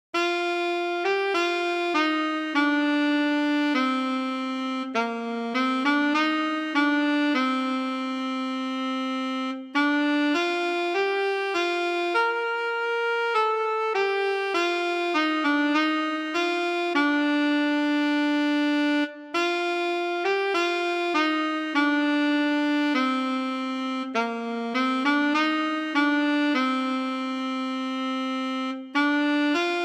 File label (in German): solo Alle Jahre wieder Altsaxophon